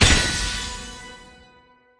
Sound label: attention free spin; steamtower